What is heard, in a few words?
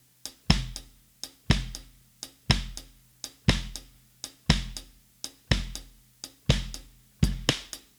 Drums, 70s Analog Funk